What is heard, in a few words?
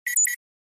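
Flash Beep Double Buzz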